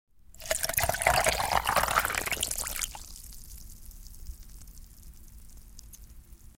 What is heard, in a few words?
Liquid Pouring Over Ice Into Glass Fills Up; Household